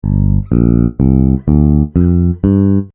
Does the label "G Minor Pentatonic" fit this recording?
Yes